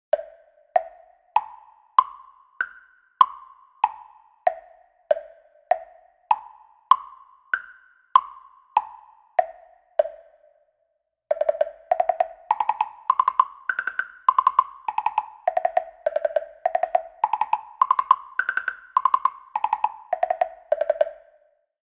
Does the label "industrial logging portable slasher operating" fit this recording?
No